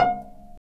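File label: strings pizzicato cello, dry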